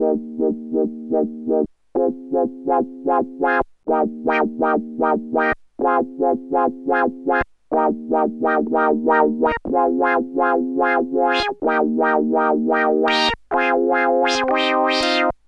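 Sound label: Cm Synth Glide, 124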